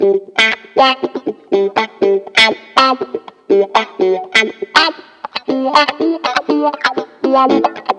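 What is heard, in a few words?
guitar, wah-wah, disco; Best Service ProSamples vol.48 - Disco Fever; AKAI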